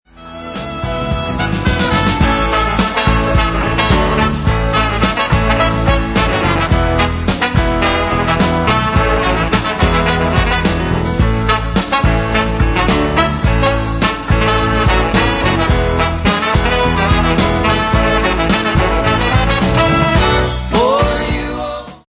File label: horns